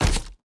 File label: fly walk